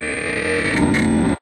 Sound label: Music Devourer Crack